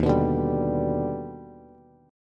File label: Eb Augmented Major 7th Eb down Bass; Eb+M7 strummed; Eb+M7 Chord; JGuitar